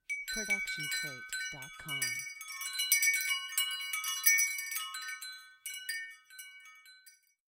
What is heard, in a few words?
Wind Chimes